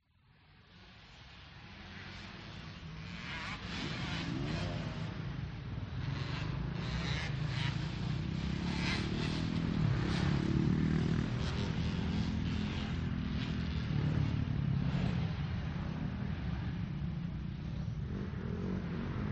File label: vehicles motorcycles motocross race pass